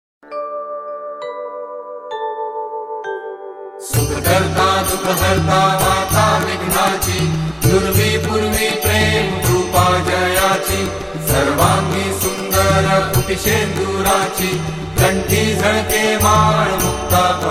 Aarti; Sukhakarta Dukhahrta

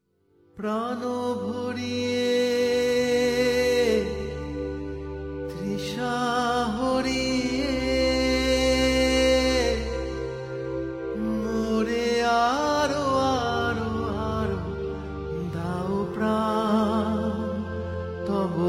Sad version

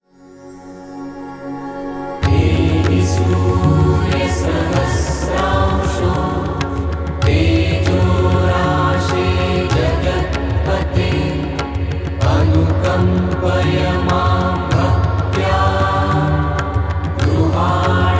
Suryarghya Mantra

